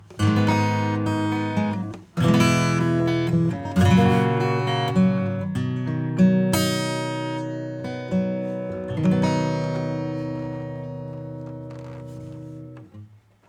acoustic default

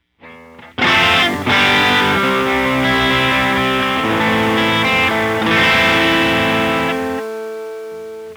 Moogerfooger MF-109S NOISE TONE 5 BANDPASS

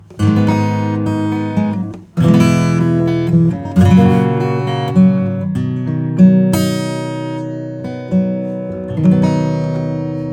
Boost Acoustic; Gainstation

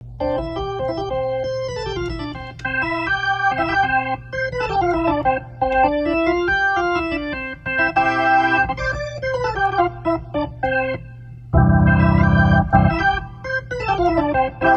Rotary organ Harmonics Galore; Rotary Mod

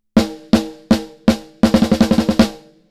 snare; Lindell 50 Series

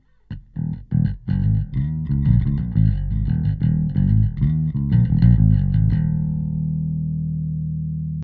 bass; Gem EQP

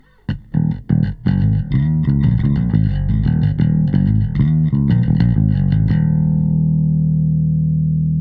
Bass; Gem Comp LA-3A

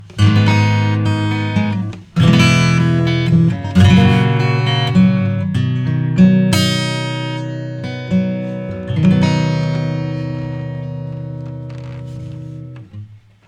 acoustic EQ MID HIGH boost; Joe Chiccarelli

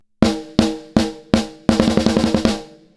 snare ANALOG off; Lindell 7X-500

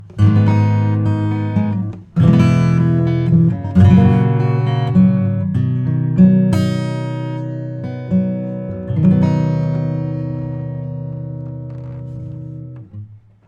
acoustic EQ HIGH cut; Joe Chiccarelli

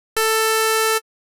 Wavetable Saw PHASE DISTORTION Pseudo PW; Pigments

